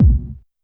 BD Analog Deep; YAMAHA RM1X

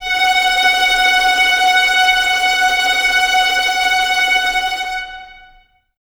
Violins Tremolo F#5; 23 Violins; String Ensembles; Miroslav Vitous

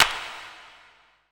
E CLAPS, ETH PERC

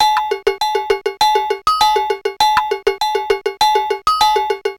Korg MR-16 Perc (100BPM); musicradar; cyborg beats

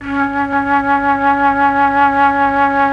FLUT1; ILIO; Propeller Island Legendary M400

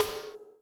WackyEFX, Electric Snare, Yamaha DTXpress

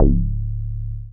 BAS SH-101; Best Service Gigapack 2

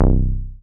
303, TB-303 BASS

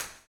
STOMPS; Spectrasonics; Vocal Planet; Blues Soul

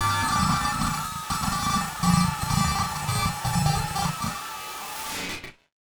Battered Synth; malfunctioning synth samples

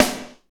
snare, R&B Snares, Roland

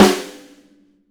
LUDWIG SNARE

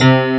D. PIANO C1; AKAI S6000 CD-ROM - Volume 1

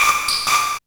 MORSE160, Hard & Loud Techno; USB Soundscan vol.01; AKAI

